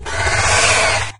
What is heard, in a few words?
imp sight; doom3